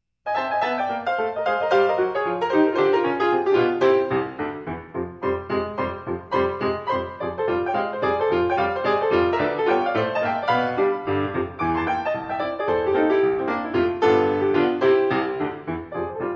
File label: Kitten Solo Piano